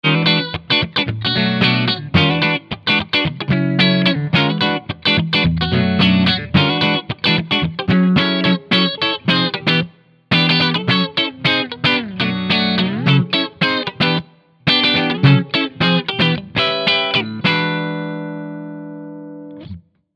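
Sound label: Gibson SG Bridge Neck clean, guitar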